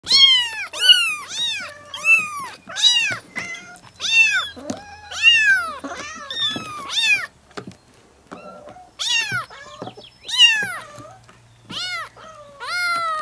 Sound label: Kittens meowing sound; animal